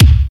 Smooth Bass Drum Sample D Key, Royality free kick sound tuned to the D note